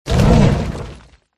defender attack voice middle

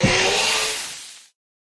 Pet Alien Die

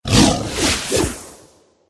tiger

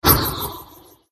ling wound

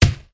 elf attack contact

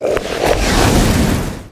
Seal, pets

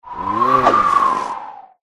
snowman attack voice middle

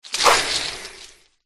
flower attack voice up

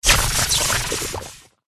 jelly fish

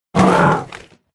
forest keeper wound

